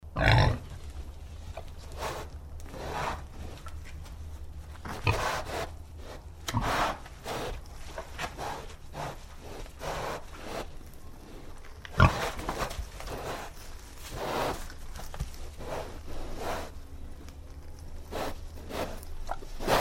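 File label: hog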